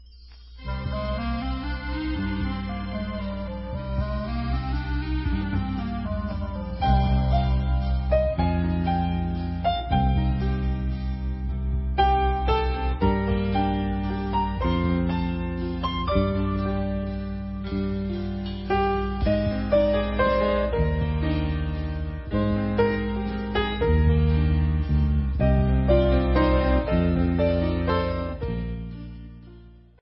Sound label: Instrumental